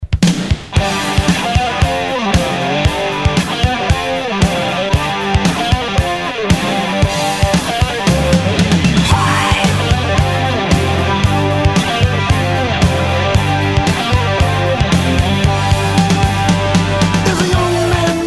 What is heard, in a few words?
Head On Rock N Roll